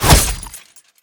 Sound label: spear shatter; garrysmod